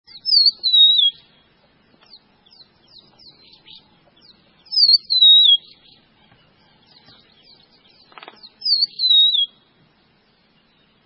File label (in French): alouette a nuque rousse, chant